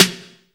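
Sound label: snare drum; Best Service Gigapack 2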